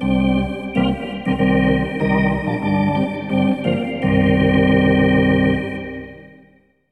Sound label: Hockey Organ